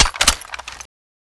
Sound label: sg552 clip in; weapons